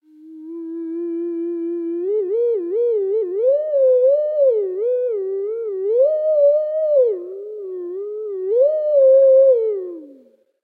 Theremin

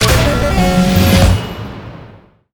Jingle - Special Pickup; Bionic Commando Rearmed

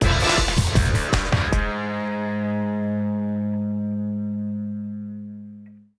Combat END; bolt 2008 pc gamerip

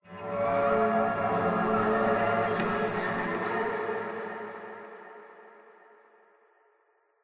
Npc Combat Strings

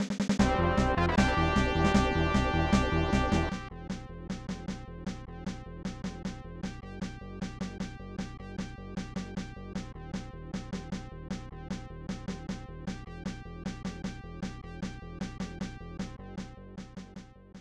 Victory!

